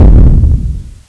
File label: T-Rex Footstomp; Playable Tutorial Level; TR1